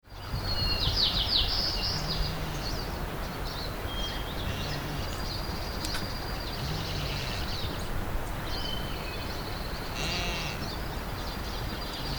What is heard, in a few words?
Mountain Goat, Mammiferes